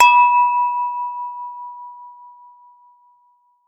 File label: Glass Clink, Beer Glasses